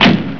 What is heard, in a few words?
DOOR CAR; MOVEMENT